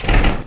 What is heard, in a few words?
open menu; amphetamine